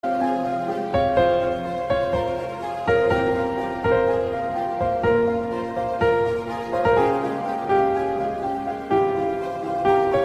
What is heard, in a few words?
piano ringtone